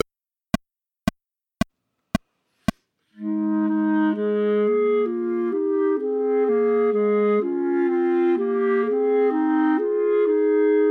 Old English, Chamber Music for 3 Clarinets, minus 1st part